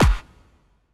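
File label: snare drum bass drum, Dance Mega Drums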